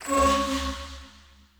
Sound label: Choir Chorus Aura Life